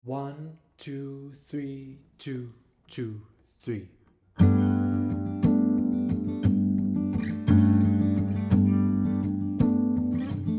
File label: Guitar Steady, Play along backing tracks; The Bonny Miller